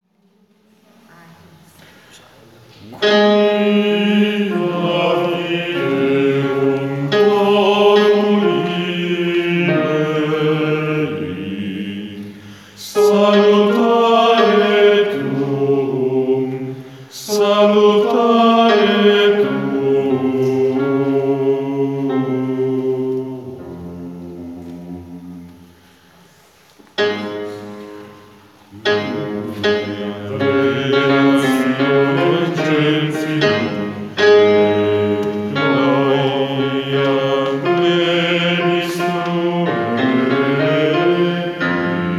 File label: Nunc dimittis Basso; repertorio polifonico